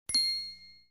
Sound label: ding